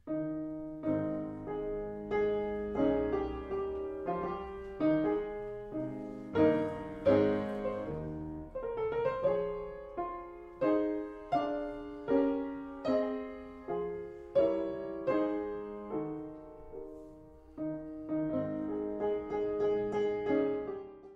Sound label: Fortepiano; Beethoven Op.26